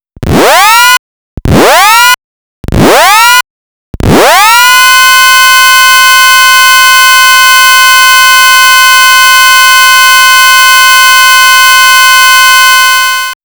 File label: entering battlefield